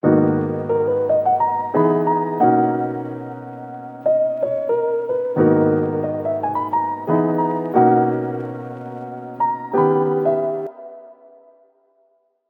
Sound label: Piano Wet - 90 BPM G# Min; Melodics; Cymatics - Eternity Sample Pack